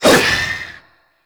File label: Void Dagger Heavy Whoosh; Warframe; Zariman Dagger; Void Mode